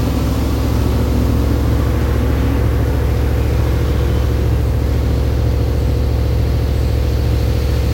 Idle, vehicles Caterham; garrysmod

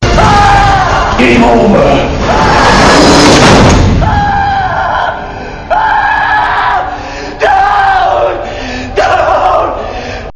game over; freak fortress 2

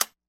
clip empty rifle; weapons; garrysmod

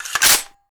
m4a1 bolt release silenced, weapons; garrysmod; dmg m4a1

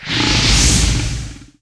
breath; cstrike; war3source; brewmaster

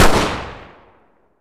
glock20 fire, pistol; garrysmod

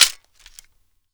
m60 close; mg; garrysmod

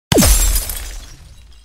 timer freeze; csgo

